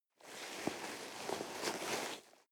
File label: nylon leather soft